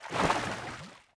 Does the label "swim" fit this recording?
Yes